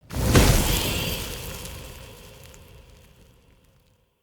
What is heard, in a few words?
Fire Mote Pickup Trail Move Burst; Motes